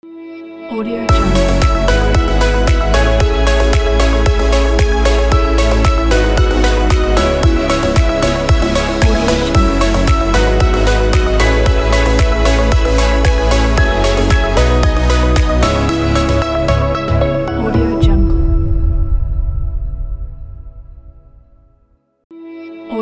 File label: fashion pop logo